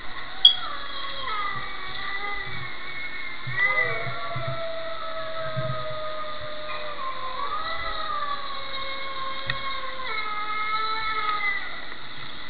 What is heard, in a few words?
wolf